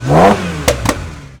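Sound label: Ferrari Testarossa Rev, Automobiles; SFX Kit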